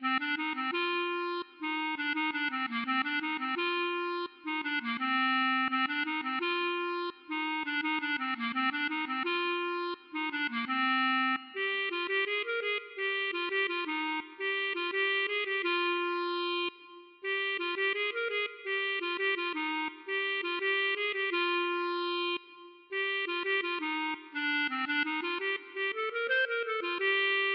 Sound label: klezmer